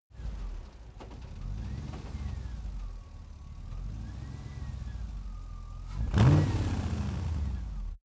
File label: car mitsubishi pajero v8 idle revving